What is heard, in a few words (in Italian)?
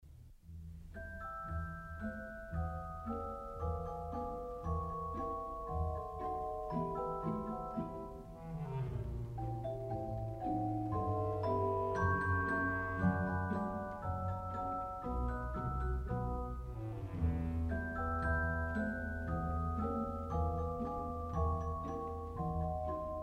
clarinetto basso solo; scuola di musica; strumenti